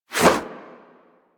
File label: Sentient Brachiolyst Melee Whoosh; Warframe; Sentients